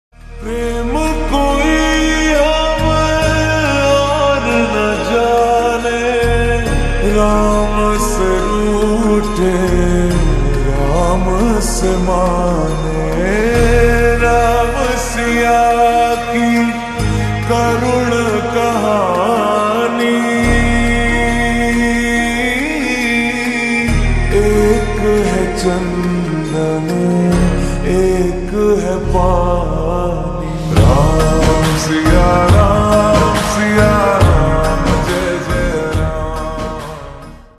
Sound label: Slowed Reverb Ringtone; Adipurush